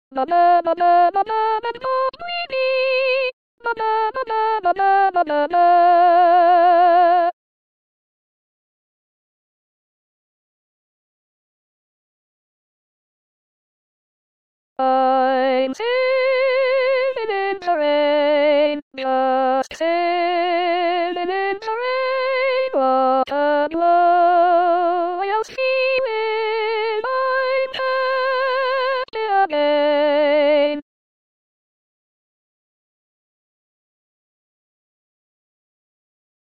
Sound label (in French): soprane